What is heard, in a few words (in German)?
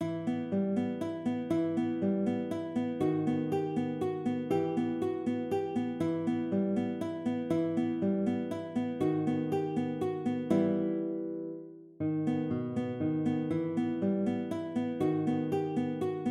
Walzer, konzertgitarre; Carulli